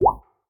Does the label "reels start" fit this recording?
Yes